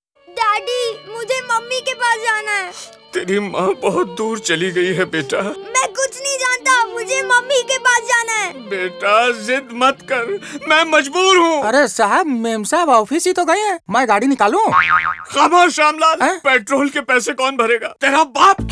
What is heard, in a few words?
Jingle